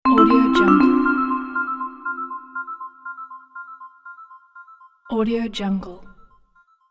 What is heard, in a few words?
sms mystery notification